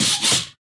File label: taunt bunnyhopper hop